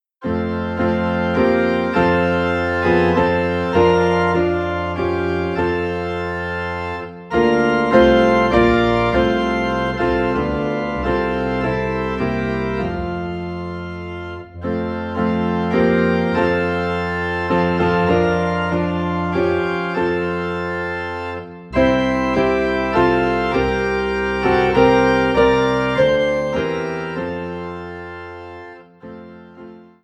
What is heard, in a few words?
Piano and Organ in F; Give Me Thy Heart